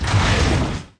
Door

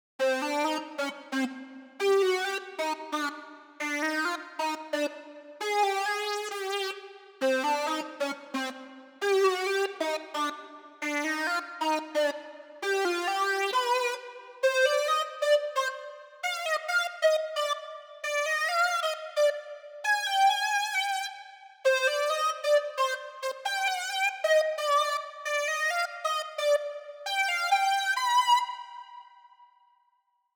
Breakout Doom Mix Stem Layer; Breakout Recharged

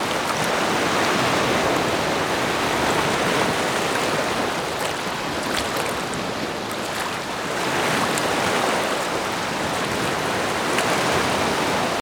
River

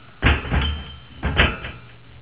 metal walk; Five Nights at Freddy's; FNAF 2

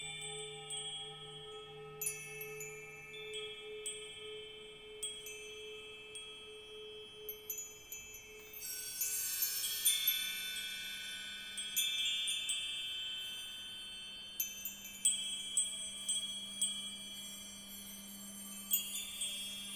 Challenge Room Chimes; Greak Memories of Azur; ps4 ps5 switch windows xbox one xbox series xs

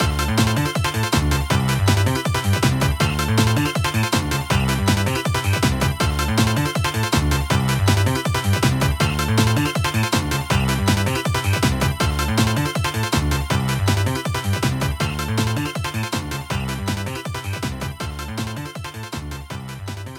SFX Item Super Star; Mario Kart 8

